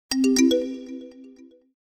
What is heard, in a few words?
NotifyRing; Air; Okapia